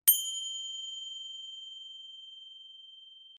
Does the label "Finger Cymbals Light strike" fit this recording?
Yes